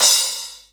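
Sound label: SPLASH, cymbal; WS#4 ROCK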